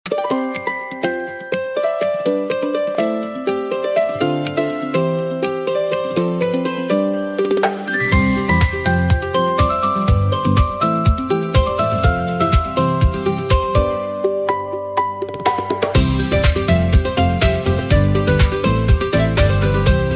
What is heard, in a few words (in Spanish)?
dulcimer tono